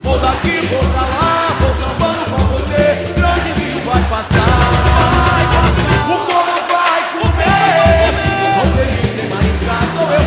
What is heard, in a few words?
SAMBA; MARICA